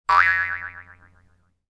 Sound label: Cartoon boing; boing sound effects